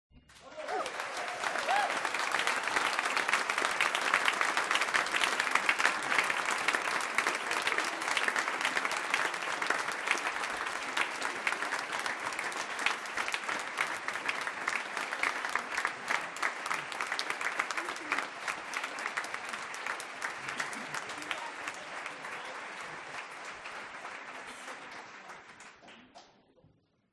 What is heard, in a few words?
HUMAN CROWD Applause Medium Audience Back; smartsound